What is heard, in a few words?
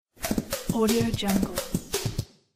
digital rebounds; andreabalzani